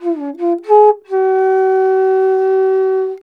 INDIA ALTO; Spectrasonics; Heart of Asia; IND ALTO PH

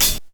HH OPEN; USB Soundscan vol.27; Ragga & Dub Reggae; AKAI

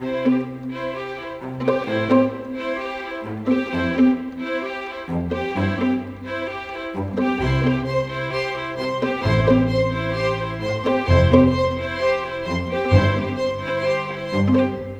Cinematic Strings, Orchestral; Sonic Foundry (Sony Creative Software); Orchestral Series VOL-3 - Cinematic

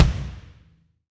BD, DR BD; Best Service Gigapack 2

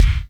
KICK; Masterbits - Total Zone; DRUM ZONE

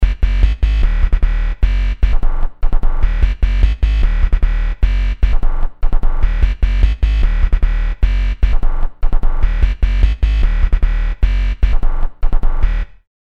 Hum Bass, Industrial

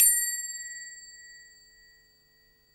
GLOCKE, GLOCKEN; SoundBytes - Early Patches

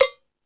Sluggo Drums, Drum Machines

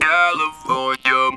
TB CALI, Talk Box

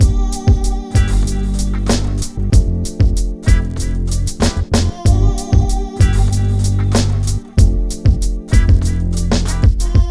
mix, 95, Hip Hop and R&B; Best Service ProSamples vol.02; AKAI

